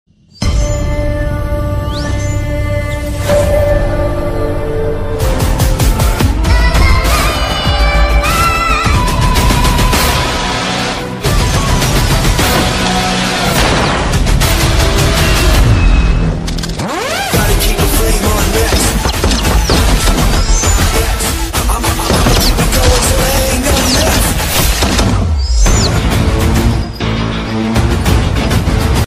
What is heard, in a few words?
agent teaser bgm; mammootty